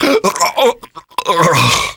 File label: death gib; dukenukem